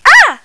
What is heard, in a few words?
pain